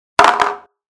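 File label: falling sound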